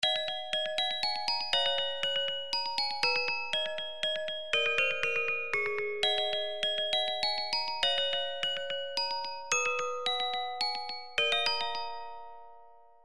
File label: game over; super nintendo donkey kong country 3